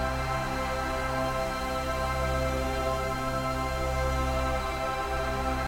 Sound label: pad; spinsane